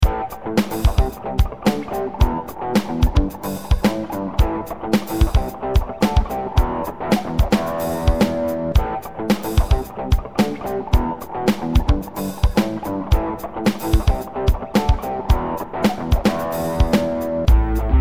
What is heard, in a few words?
rock